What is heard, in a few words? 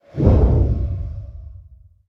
basic fire; smite; zhongkui